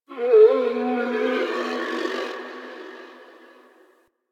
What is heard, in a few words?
Landscape Distant Creatures, Ambience; Warframe; Venus Open World; One Offs